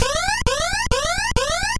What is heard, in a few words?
Hurry Fanfare; Bubble Bobble